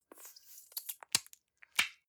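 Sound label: gel dispenser squish air, bathroom; haus garten; badezimmer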